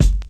prominent kick drum single shot g key

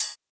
natural closed hi hat sound D key; hat samples

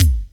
treble rich kick one shot c key

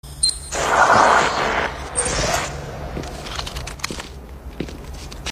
beeps and crackles electrical

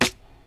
juicy snare drum f sharp key

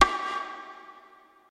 high quality tight lows snare one shot D key; snare drum samples

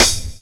sharp snare one shot a sharp key; snare drum samples

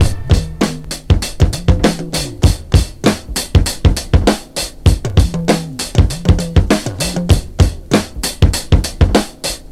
99 bpm hq breakbeat f sharp key

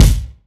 kick g key; drum single hits one shots

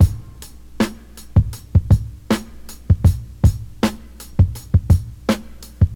81 bpm hq breakbeat sample f sharp key

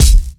rich high end kick drum single shot F sharp key

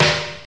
classic snare drum d key; snare drum samples

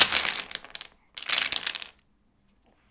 wooden jenga blocks falling

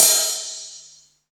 large room drum ride sound a key; cymbal drum samples

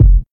dry bass drum single hit f sharp key; kick drum samples

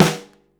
rich lows steel snare drum e key

